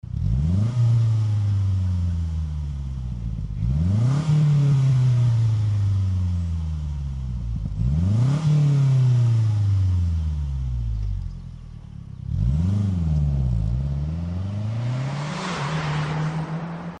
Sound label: magnaflow exhaust systems